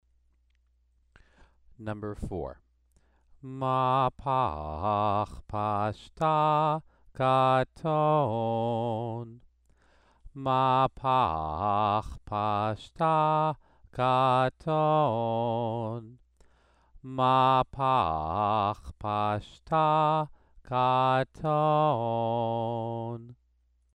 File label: Torah trope exercise